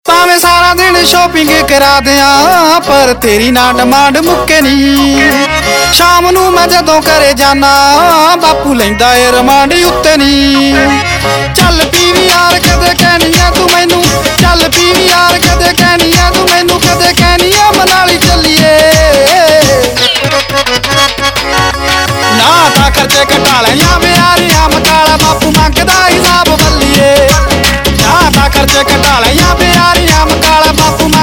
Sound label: Ringtone, Punjabi